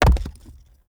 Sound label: masonry hit